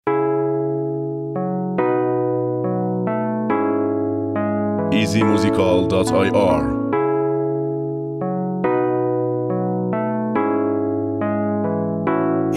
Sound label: Beat